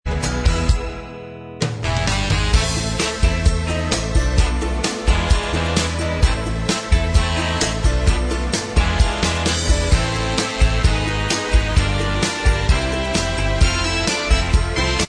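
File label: instrumental track, backing tracks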